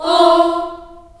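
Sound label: F3 Choir Children Ohs, Choir Acoustic & Synth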